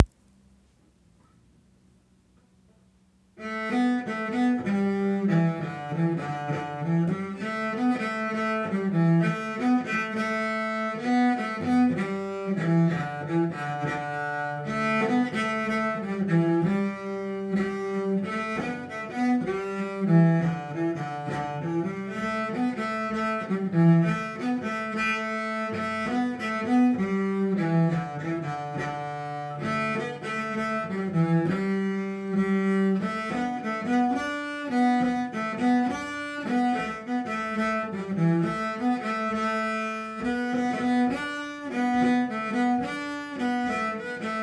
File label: Cello; Bohola Jig